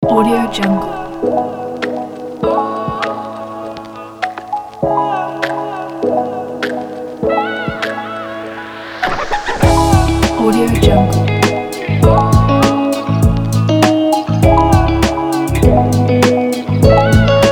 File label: atmospheric guitar lofi